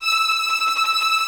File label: VI TRM FE5 R, Orchestra; AKAI Advance Orchestra Upgrade 97 Vol.1; VI TREM F